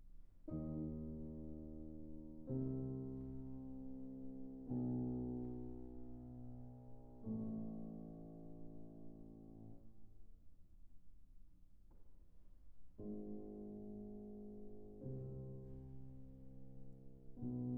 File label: Carnaval for piano; Sphinxes; Schumann